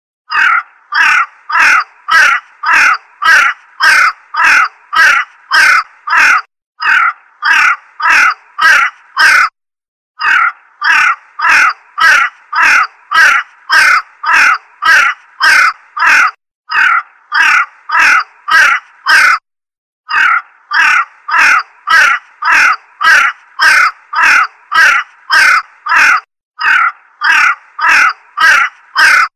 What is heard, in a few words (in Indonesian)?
Suara Burung Gagak